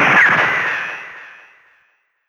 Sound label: Gunshot motif